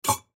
Straw Holder Empty, Straw Holder Empty Close Glass; Restaurant; Miscellaneous Bar; Foley; Blastwave